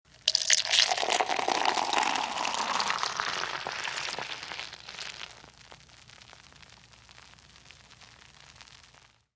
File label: Beer Pour Classic Com; eating & drinking